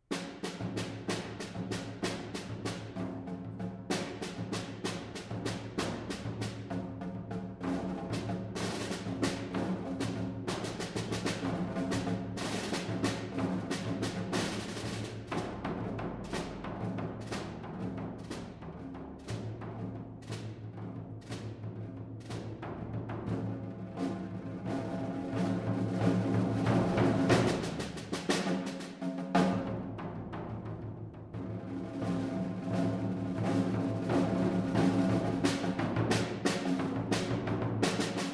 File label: Percussion Duet; Scherzo Due; The Johnson State College Concert Band